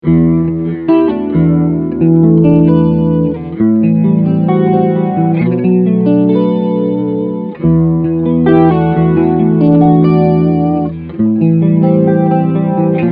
Chorus Clean

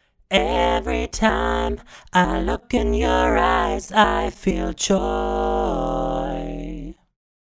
Vocals Mutate Singing Android; Antares

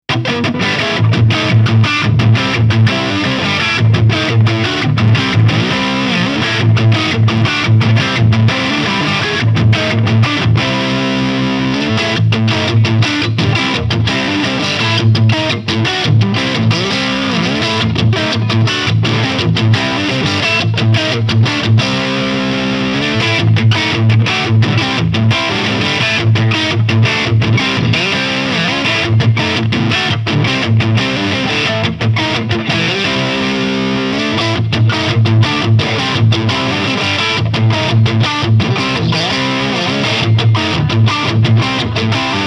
Ibanez RG 550 DY Pickup 1 5 Lead

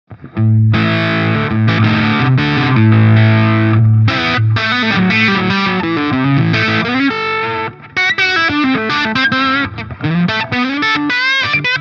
Walrus Audio 385 Mode 1 Gain max Treble 12h

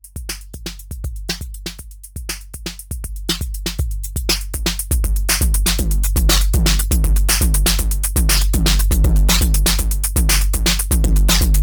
Input Gain Overdrive Beat; Bastl Thyme

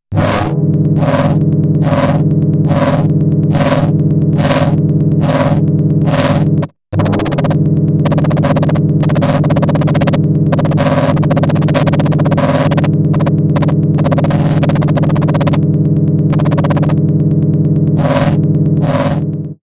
AM und PM

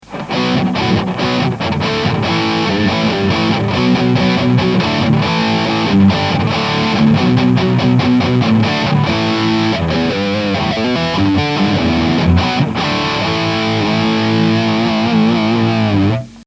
Blackstar max Gain

